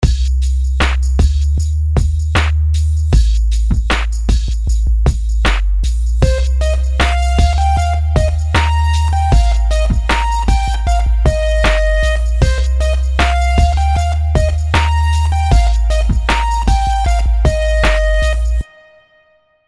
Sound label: rhythms simple 16ths